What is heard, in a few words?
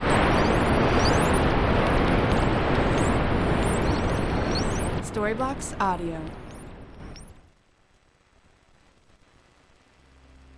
Ambient House Ambience Rev FX 136 BPM A